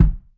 tight low end kickdrum sample g key, Royality free bass drum sound tuned to the G note.